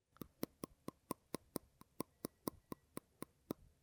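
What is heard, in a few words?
teeth chattering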